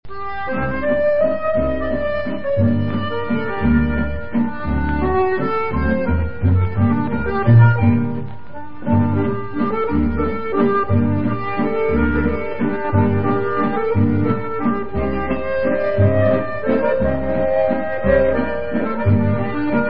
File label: Finnish Waltz Instrumental